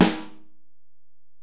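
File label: Acoustic Snare, Alesis DM-PRO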